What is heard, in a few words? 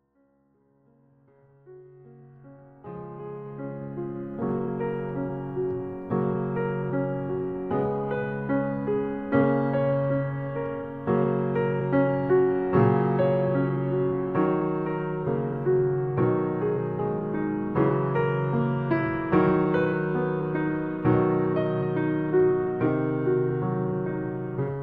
HIGH VOICE, Piano, Backing Tracks; brahms